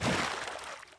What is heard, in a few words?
wet step; DAoC